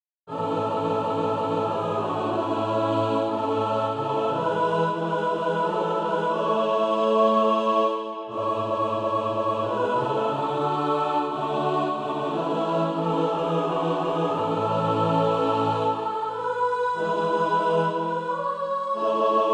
mixed

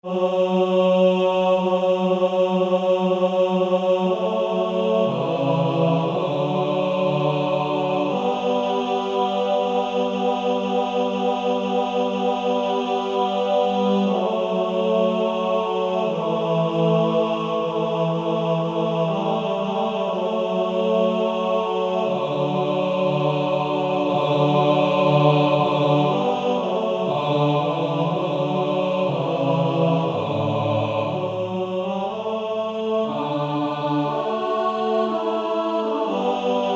Male Choir TB

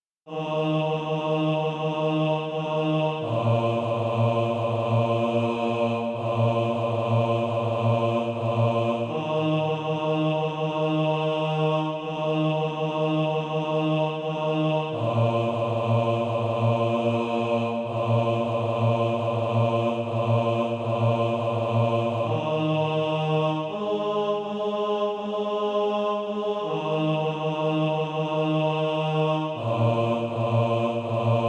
bas

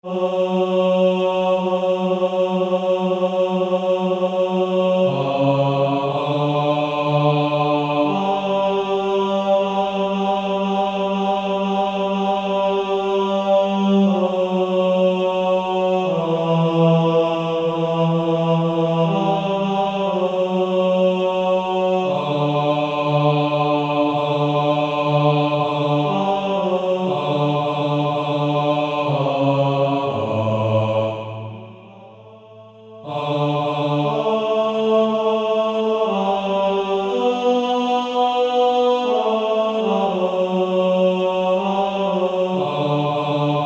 Male Choir 2B; Bass; God Be with You

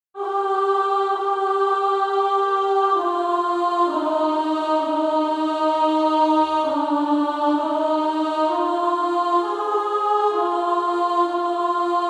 Female Choir; Alto

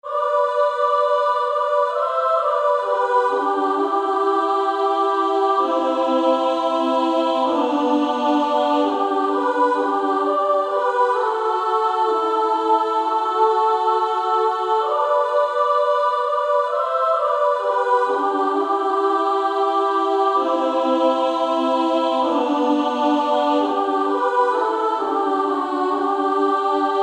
Female Choir

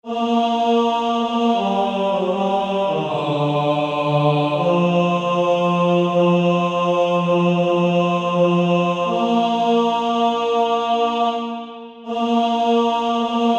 Male Choir; Pass me Not O gentle Savior

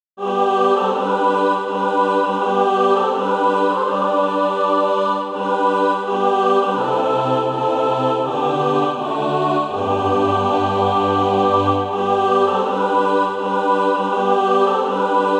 mixed

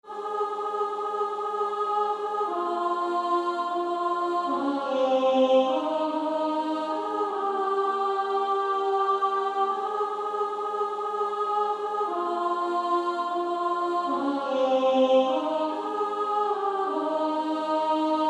Female Choir S2; Only a step to Jesus

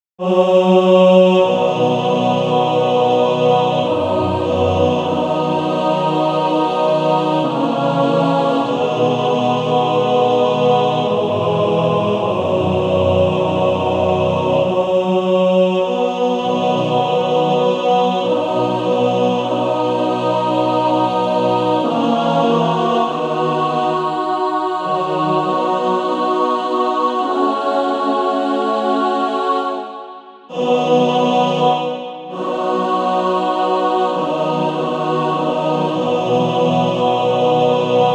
Male Choir TTBB

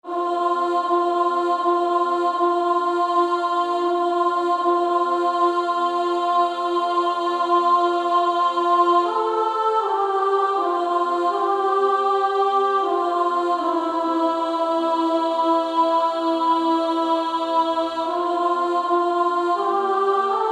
Female Choir; 1st Alto

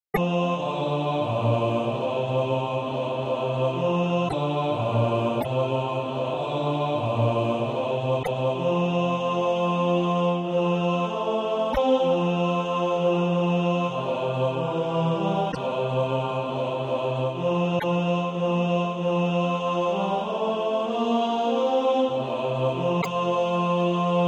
bas

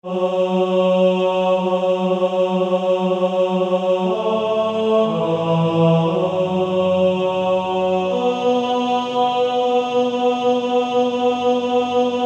Male Choir; TTBB; God Be with You

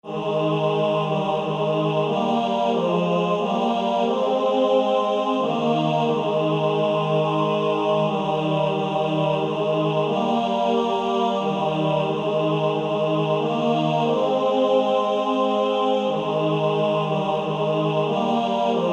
Male Choir TB, 2-part with Practice Music; Grace Greater Than All My Sin

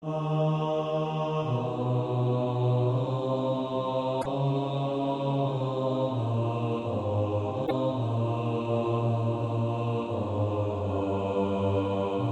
bas; Lead me Lord

